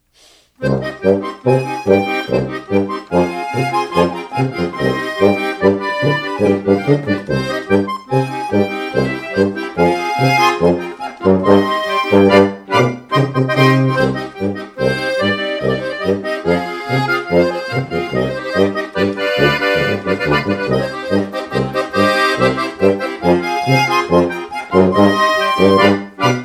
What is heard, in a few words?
bus drivers polka